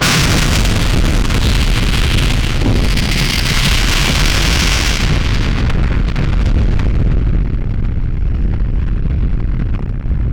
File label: hell fire